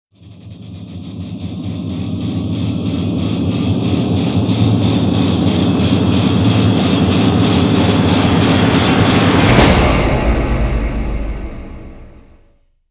Rise Atomic; Brand X Music; Brand X Music Catalogue - Editor's Essentials

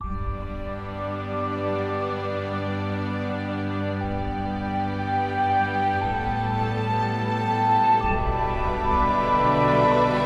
Clear Ambient

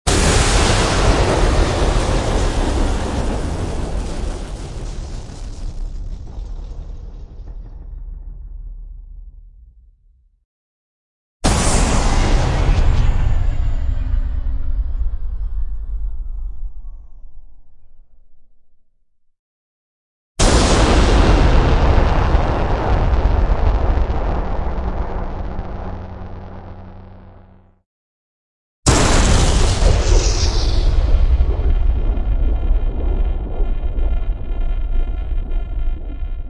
Explosions; Epic Tools vol. 1

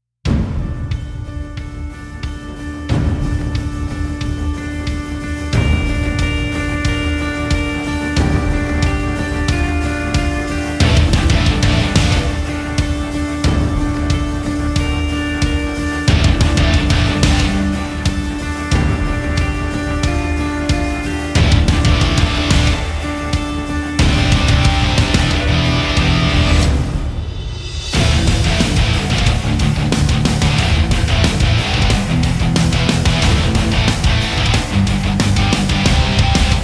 Epic Speed Metal, Band Only; Gothic Storm Music

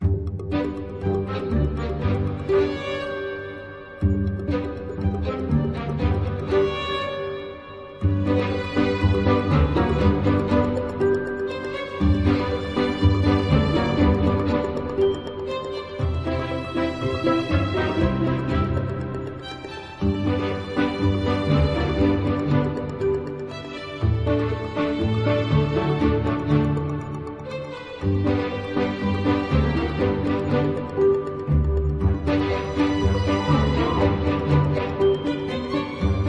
Playful Ambient

